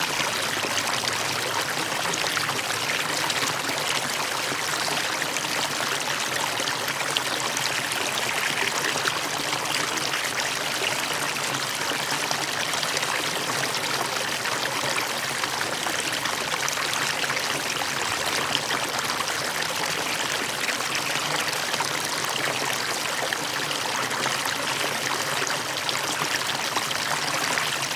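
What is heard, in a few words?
foamy bubbling sea waves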